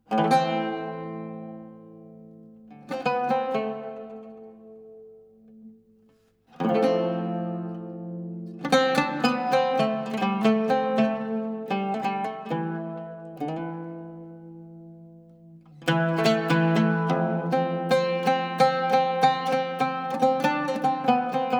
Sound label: An Old Bell